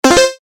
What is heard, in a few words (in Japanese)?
決定 中音; モノラル